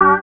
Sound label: chords